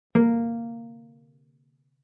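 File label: Cello pizz fortissimo sul A Bb4; MIS Pitches - 2014; Strings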